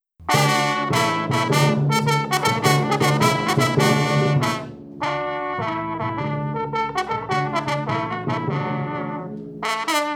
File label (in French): sonate